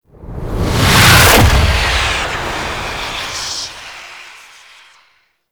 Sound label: Fly By Slow, Vehicles Flight; Warframe; Liset; Stalker Liset Skin